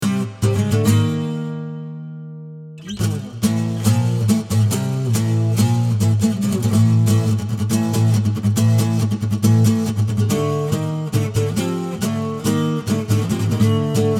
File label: Acoustic